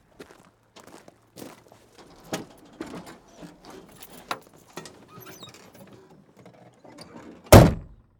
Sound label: Semi Truck Driver; Large Vehicles; Blastwave